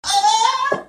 EEYYAAH

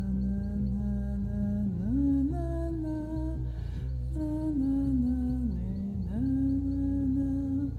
hum; Huntress

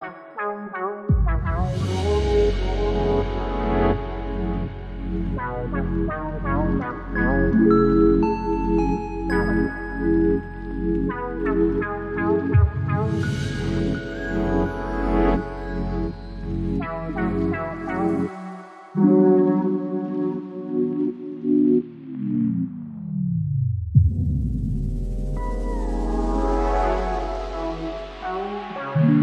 Other ring tone

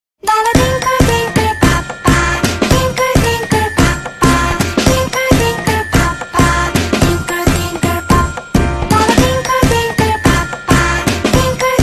Twinkle Pop

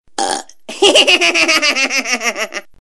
BURP

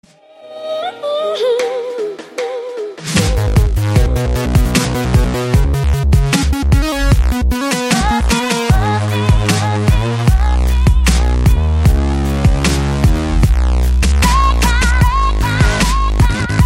Coffee Break Ring

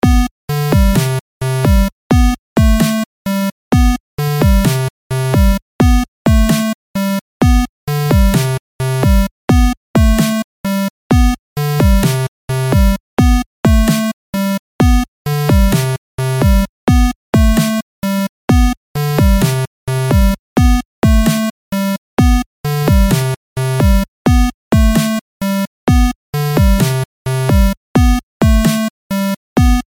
8 Bit Game Ringtone

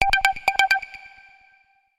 Kicks

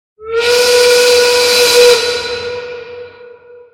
Detroit work whistle

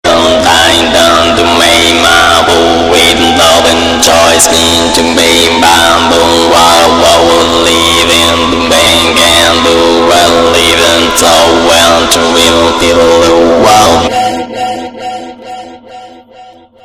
Trance

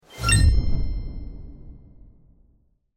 Future ping

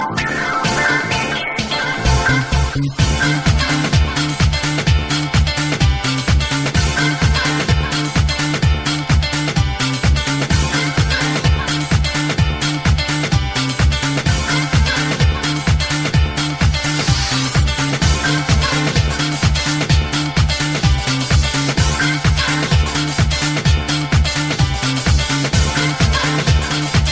House Music

